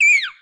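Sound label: Slime Damage; Seer; GamigoNA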